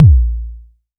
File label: tight kickdrum sound a sharp key; kick drum samples